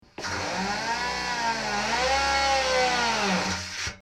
Drill Inter Barrel, servos

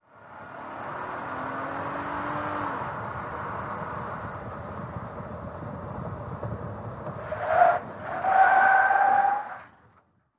SUV Trk Accel Skid

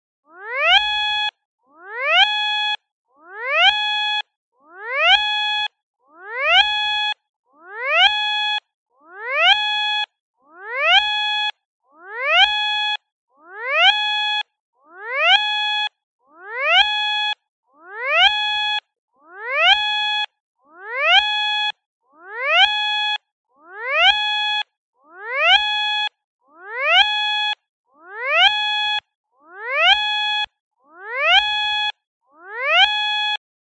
Beeps Warning Warnin

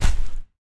LARGE CREATURE FOOTSTEP, monsters

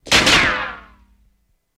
Bullet Impact Clang, metal

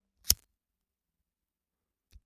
butane lighter

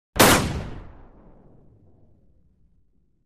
Gun Shot Lrg Shot

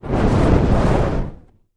Fire Ball

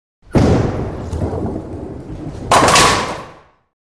Bowling Ball Rolls

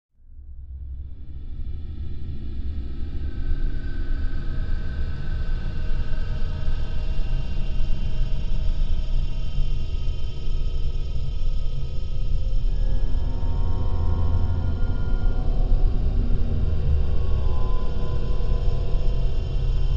Bed Impending Doom Sh

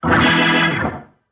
Music Effect Bluesy, organ

